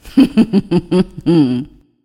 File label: laugh